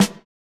snare, snare house

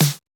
snare; Electro; futurefunk

